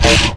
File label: shield, anomaly; Dynamic Anomalies Overhaul; eugenium anomaly; mefistotel